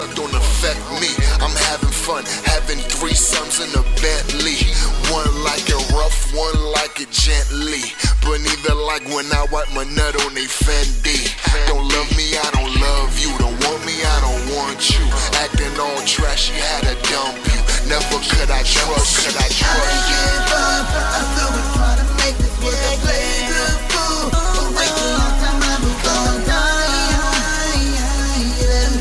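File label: give me a break blues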